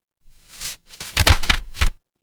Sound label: paper fabric page turn